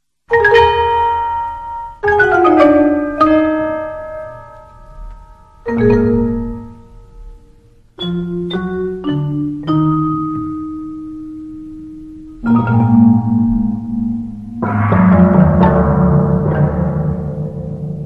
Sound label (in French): Toccata et Fugue transcription Percussions; Jean-Sébastien Bach